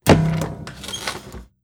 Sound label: piano fix